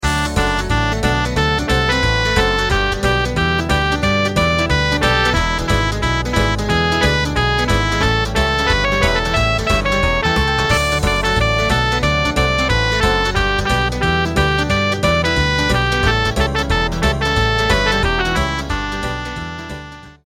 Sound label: Karaoke